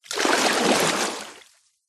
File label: nature water deep surface movement slosh